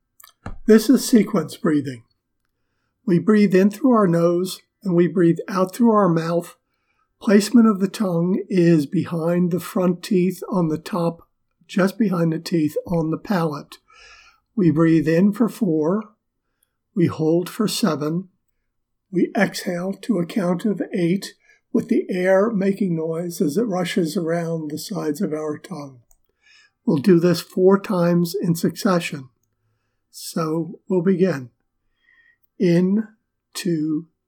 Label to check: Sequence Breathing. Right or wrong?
Right